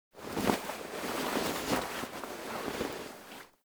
Foley Get Up; Warframe; Quests; The New War; Narmer Outpost; TNW Snt Chamber Ceremony End; Ostron

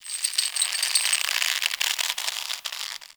Chain Rope Basket Creak; Ambience; Dungeons; Under City

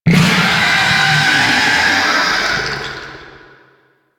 Raknoid Scream; Venus; Warframe; Veilbreaker; Quests; Kahl

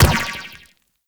Ball Bounce Rock; Warframe; Missions; Tenno Ball

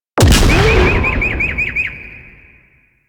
Arm Destroy Explosion; Enemies; Warframe; Eidolon; Grn Bomber

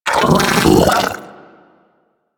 Arachnoid Vocal Attack; Warframe; Corpus; Arachnoid Hunger

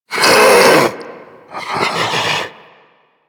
Infested Ancient Idle; Enemies; Warframe; Puss Ancient

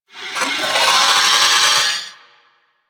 Purgatory Warrior Possess Scream, Barks; Warframe

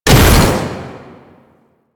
taller door open mech; ambience; Warframe; Entrati

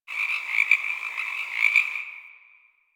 Beach Night Frog; Ambience; Warframe; Grineer Beach

